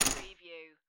Poker Chips Drop Two Chips On Wood Table

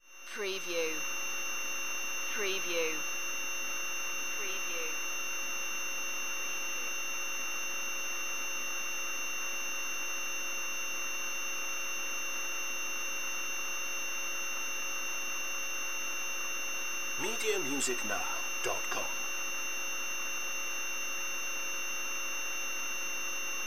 Computer Screen High

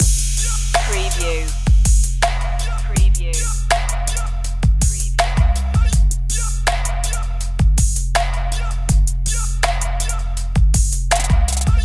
trap this loop

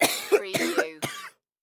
Cough Male

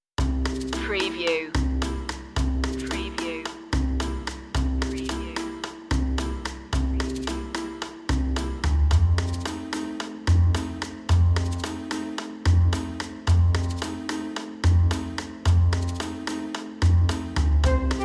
light walk no piano

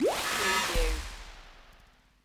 Space Cut

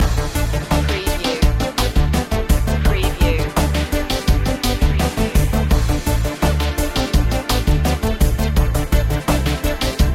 astronavigator loop

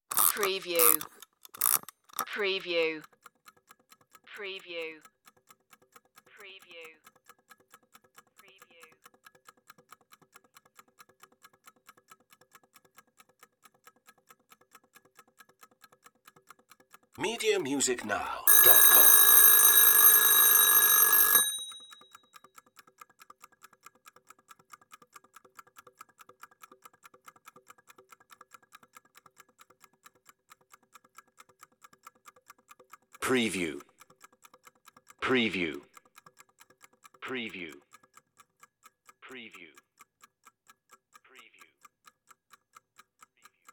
kitchen alarm clock

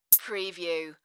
High Hat Closed

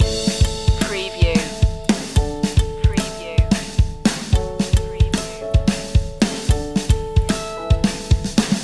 power pop goes bang loop

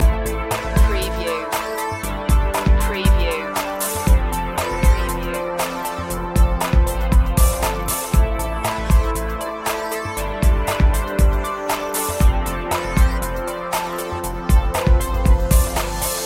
come save me flash loop

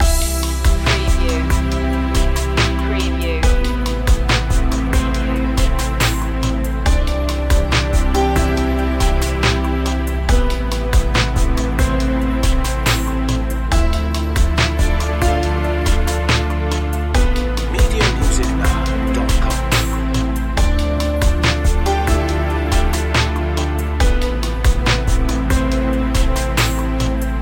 new life loop

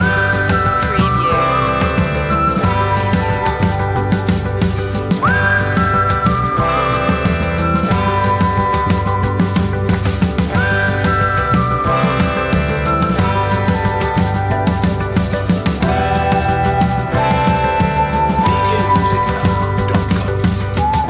whistle along loop

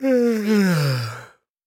Yawn Male; Sound Effects Bible

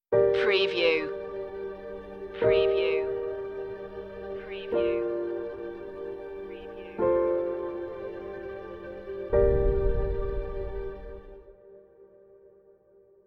escapism stinger

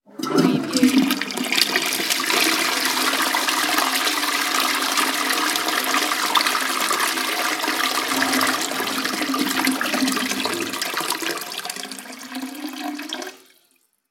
toilet flush; Household Sounds; Bathroom Sounds; the sound pack tree

